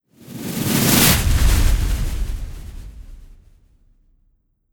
Infernal Flame; Legend of Keepers

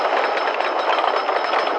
Chain Lift

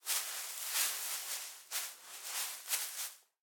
Foley Grass Movement Short Hand Rustle

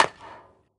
Sfx Baseball

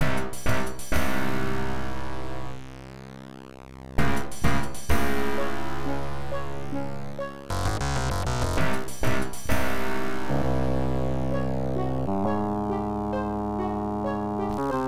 TERROR

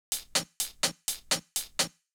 Hihat 4 beats

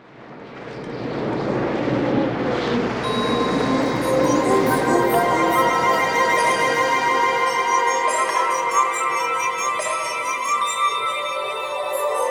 Ice; Flying Superboard: Magical Journey to the West

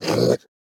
Damage

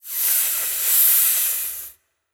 Snake

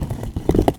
bridge turn

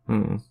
Sad chorus

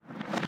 Sittable Plastic Chair Stand

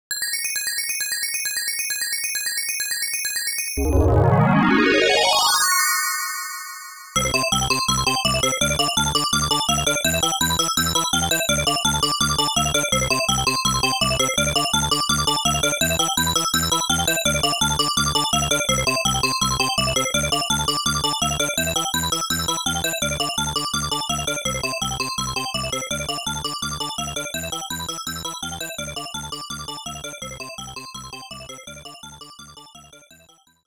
Extend Bonus Music

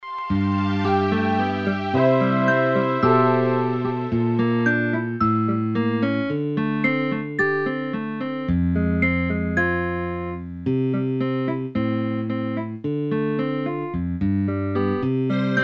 Ring Tone (Poly); Smeshariki: Kaleidoscope of Games

